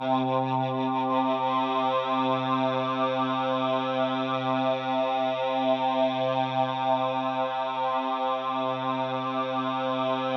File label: C3, Synth Leads